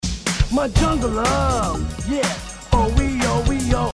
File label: oweoweooo